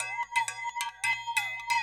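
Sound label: triangle; ILIO - Ethno Techno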